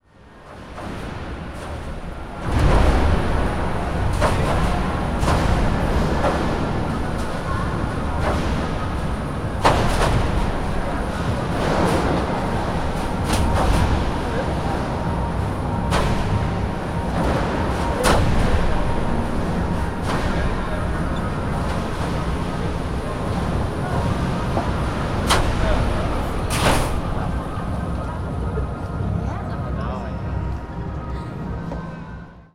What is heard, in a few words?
High Pressure Hose In Tank